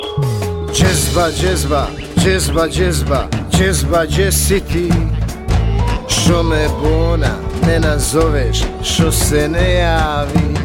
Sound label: Jazz